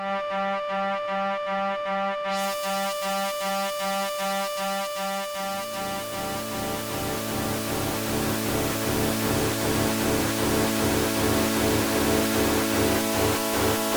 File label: A drone